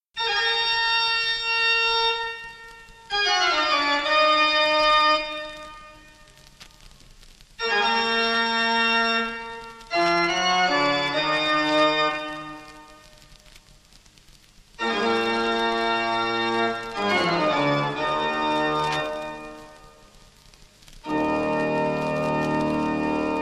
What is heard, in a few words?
TOCCATA E FUGA; J S BACH; JOHANN SEBASTIAN BACH